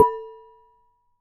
Damped Hit, Glass, Large Wine Glass

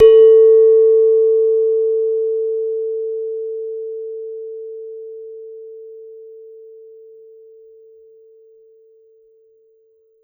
Gender, Gamelan

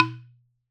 Wooden Bar Hard Strike

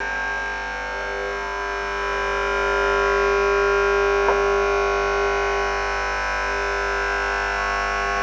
Toaster Hum Short; Kitchen

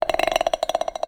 Bolt Glass Scrape, Medium Wine Glass

